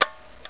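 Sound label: Balda Jubilette, camera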